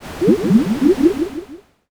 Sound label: poison